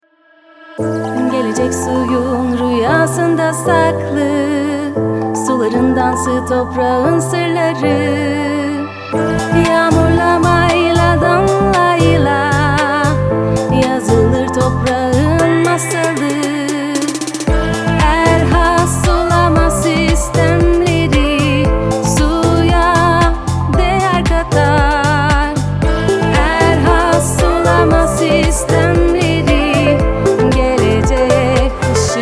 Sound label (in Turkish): vokal